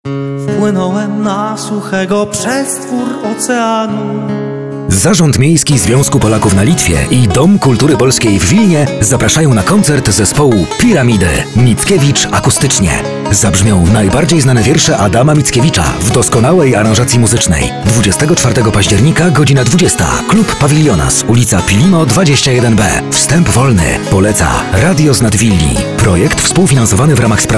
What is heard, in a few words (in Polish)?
akustycznie; Związek Polaków; Mickiewicz